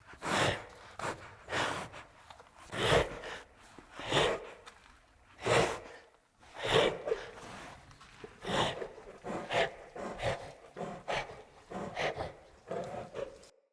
Wolf Breathing Jog Loop; Animals; Orengal Pact Wolf; Movement Breathing

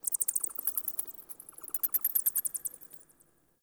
Insect Night; Warframe; Eidolon Plains; Ambience

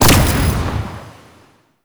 Shield Deactivate; Warframe; Nineteeen Ninety Nine; PvPvE

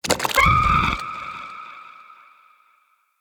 Shrimp Vocal Pain, Creatures; Vocals; Baliq Shrimp Glade

